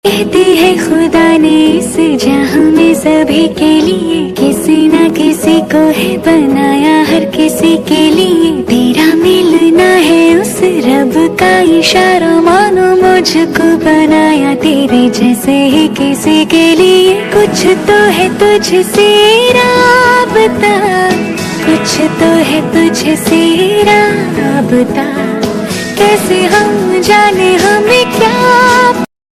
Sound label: female hindi ringtone